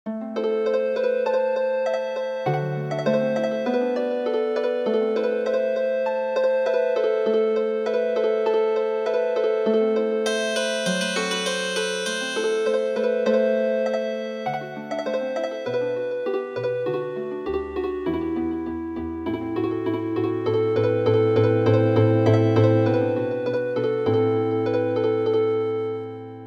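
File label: Healing Harp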